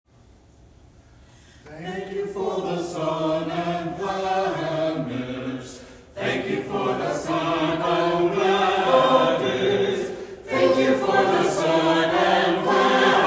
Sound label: Table Grace Choir Round